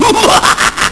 muhaha; tfc

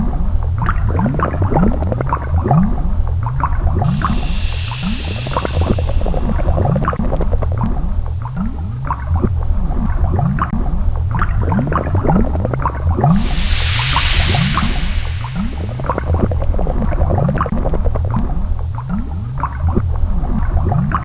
lava; svencoop; adamr

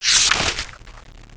papers; svencoop

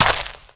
player dirt; svencoop

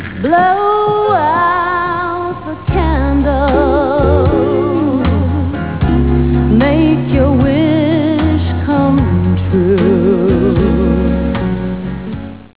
blow candles; tfc; united

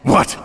drop cash; svencoop; voice commands; Snake

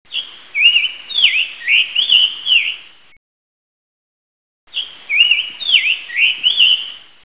tanager; svencoop

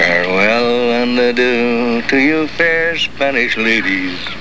farewell, underwater; dod

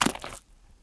pl mud, player; cstrike

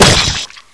fire; gearbox; agrunt

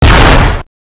tank; gearbox

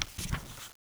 wpn moveselect; wizardwars

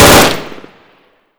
m16 burst, weapons, m16a1; hunger

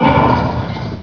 attack; ichy

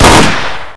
ber93r burst, weapons; firearms

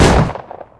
old shot

